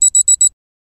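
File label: Clock Alarm Electric Be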